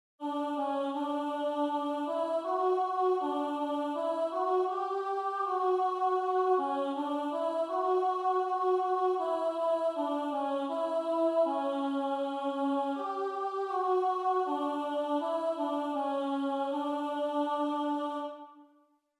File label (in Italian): i tuoi santi contralti